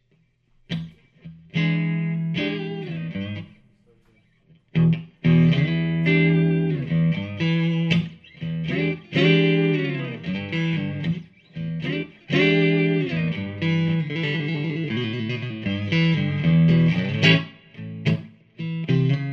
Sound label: fender custom shop 1957 stratocaster relic, electric guitar solid body